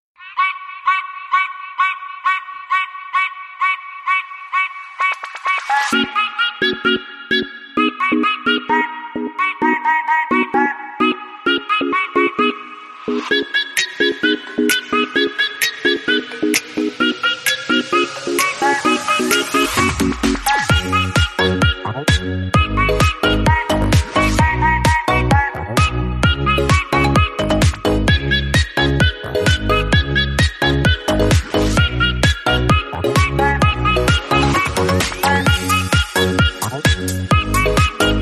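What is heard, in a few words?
ringtone remix instrumental; Khong Loi; nhac chuong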